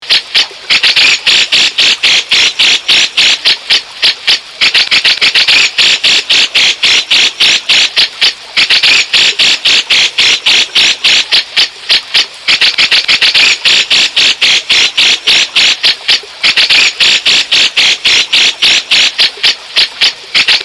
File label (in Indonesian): cucak jenggot